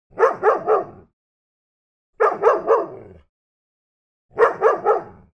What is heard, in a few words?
dog barking three times in a row